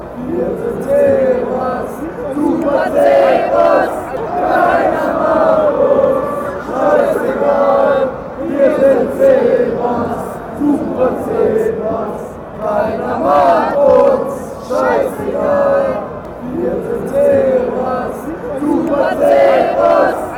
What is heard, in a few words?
zebras fanchants